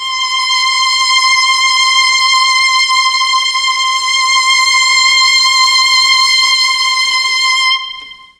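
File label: C3 violins, mellotron; CHMB 3 VLNS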